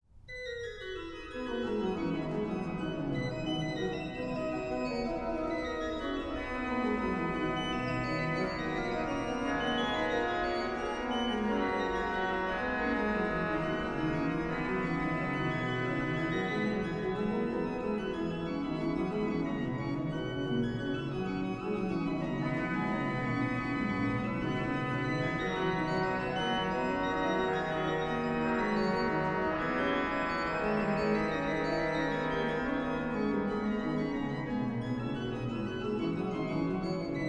Bach Organ Works Chorale Variations, Canonic Variations, Canone all ottava; BWV 769a